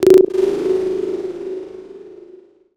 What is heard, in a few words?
thorium cinematic trailer texture; Bluezone